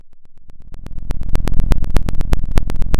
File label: Sound of a fire crackling